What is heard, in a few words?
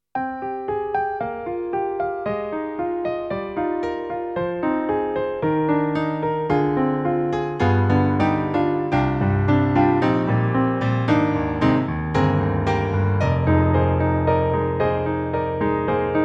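Grand, piano; Roland; XV-5050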